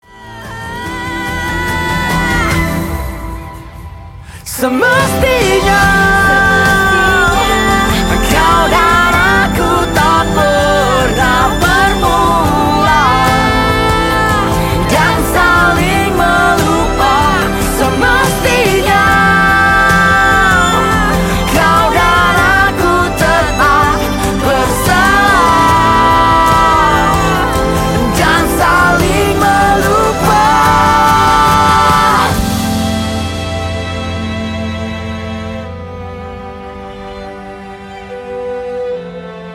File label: Acoustic